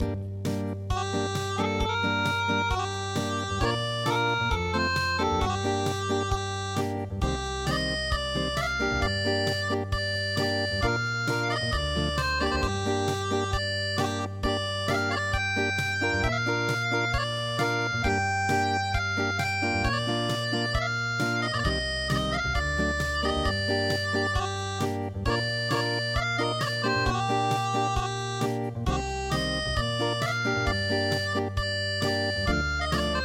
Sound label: Acoustic; By the Stream